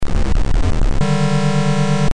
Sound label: warning